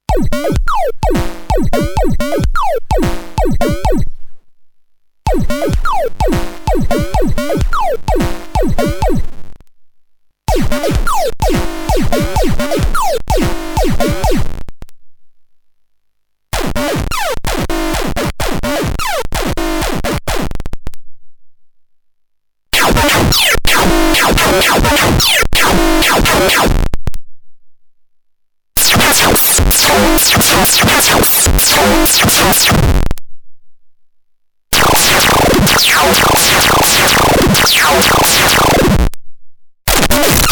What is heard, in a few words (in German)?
DCM8 diverse DAC Algorithmen